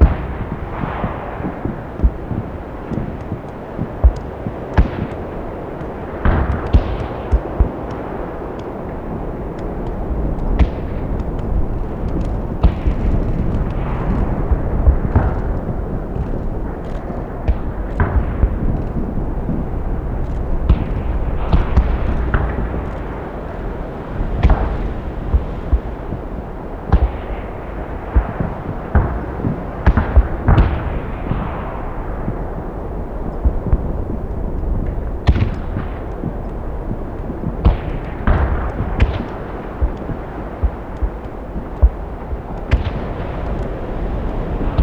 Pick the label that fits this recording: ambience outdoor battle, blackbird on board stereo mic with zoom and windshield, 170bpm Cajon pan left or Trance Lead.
ambience outdoor battle